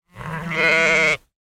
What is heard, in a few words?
sheep x2 baa